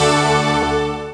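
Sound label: synth accordion; Best Service Gigapack 1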